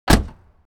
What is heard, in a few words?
transportation car doors